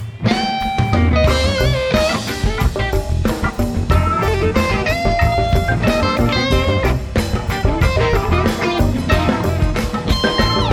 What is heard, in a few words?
jazz